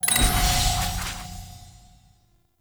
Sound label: Open Title Selection Menu